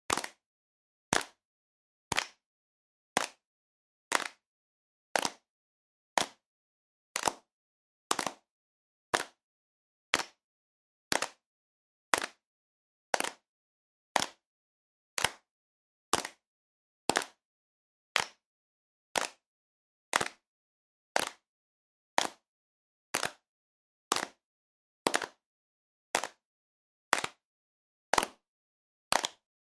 Slow Clap, Sound of unhappy small audience slow clapping; Applause; Human